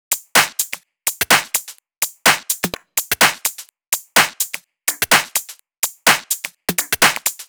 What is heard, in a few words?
126BPM Drums; Vengeance Samples; Lineup Kit